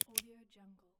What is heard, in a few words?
clicky top pen; stormwaveaudio